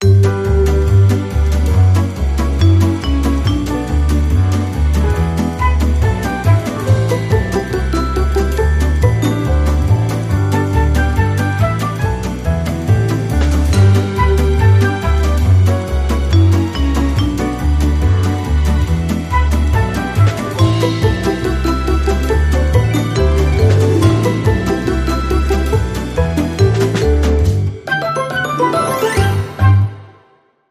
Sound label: 50s Comedy